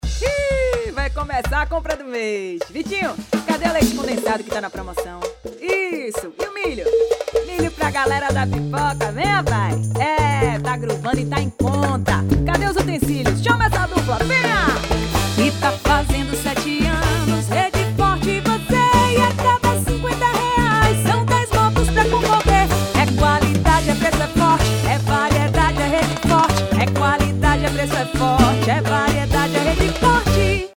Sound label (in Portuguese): jingle